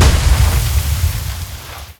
snowball target; tusk

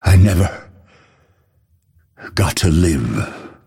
death; dota; phantom assassin

